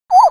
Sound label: crossbills flight